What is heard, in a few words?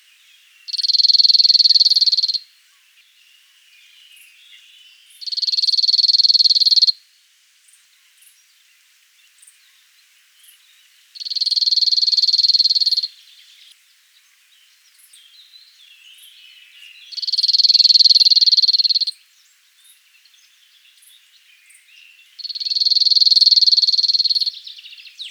call, cirl bunting, Emberiza cirlus, Background: Blackcap and Chaffinch song. Note the fast rhythm of this song; data from 1 bird